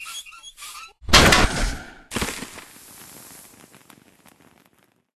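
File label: Melting Furnace Hot Coals; Dark Army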